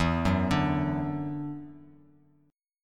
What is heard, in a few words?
E 7th Suspended 2nd, down; E7sus2; arpeggiated